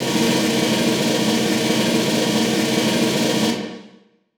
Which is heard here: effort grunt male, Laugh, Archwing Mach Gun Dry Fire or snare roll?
snare roll